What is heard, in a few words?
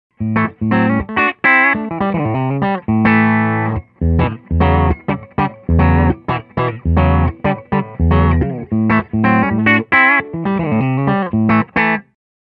Hollow T M3 bridge, pickups